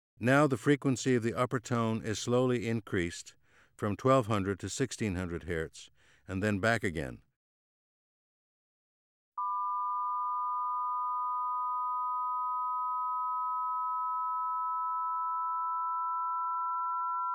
Aural combination tones, Auditory Demonstrations

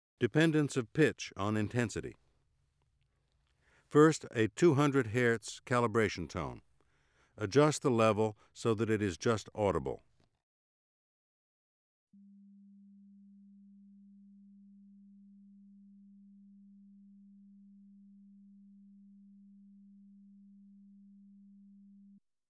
Dependence of pitch on intensity

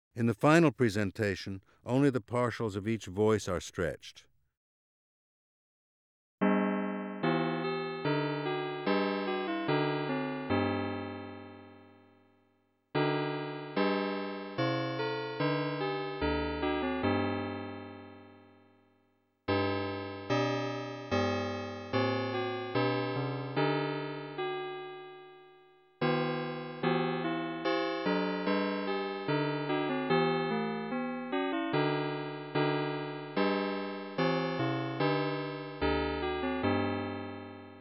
Tones and tuning with stretched partials

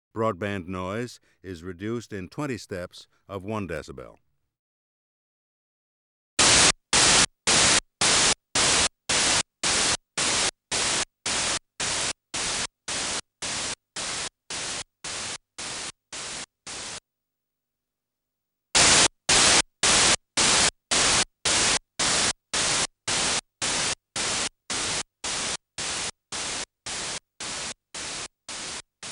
The decibel scale

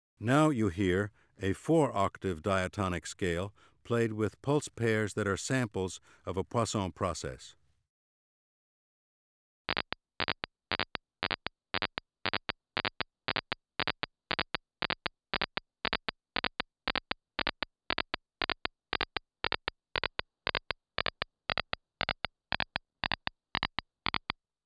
Scales with repetition pitch

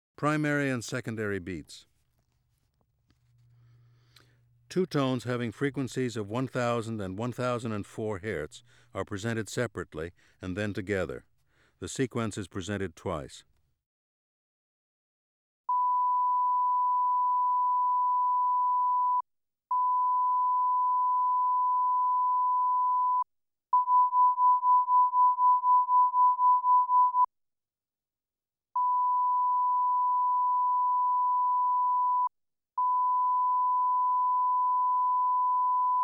Primary and secondary beats

